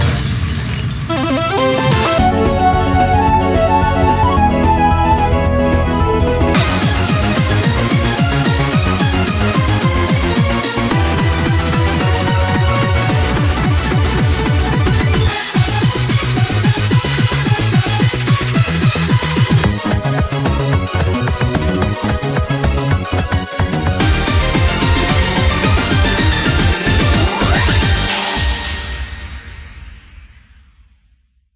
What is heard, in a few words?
bass